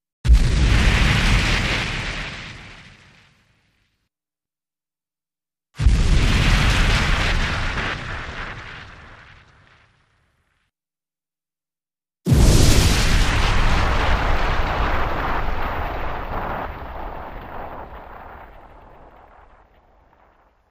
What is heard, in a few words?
Explosive Fire Burst, More Fire, Good For Catapults, Fireballs, 3x